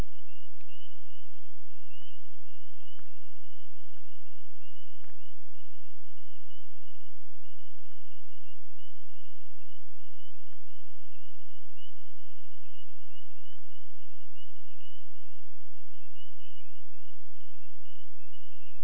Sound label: Spring Frog Spawn